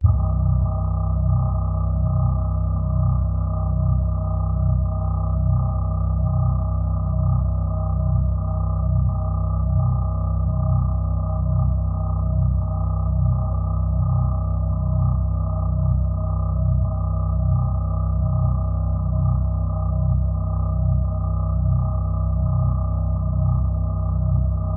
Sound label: vehicle underwater or space resonation; lukas tvrdon